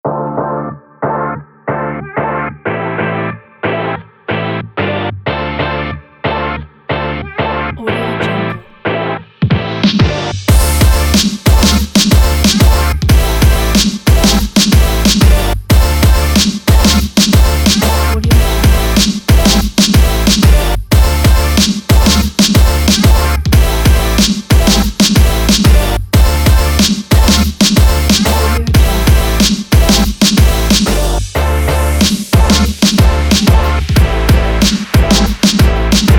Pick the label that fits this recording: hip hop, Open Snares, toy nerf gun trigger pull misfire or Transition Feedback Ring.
hip hop